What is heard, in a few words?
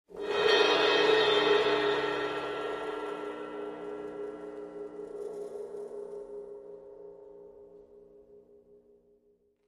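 Cymbal Large Trill Timpani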